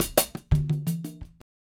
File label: Bongo item place; FREE SFX PACK VOL 2